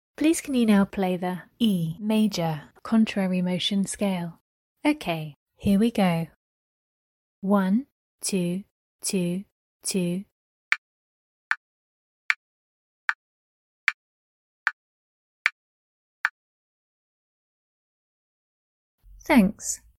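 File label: E major contrary motion scale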